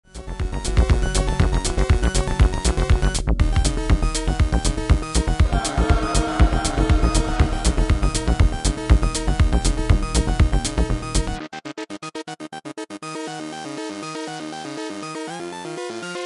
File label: Wonky Techno Banger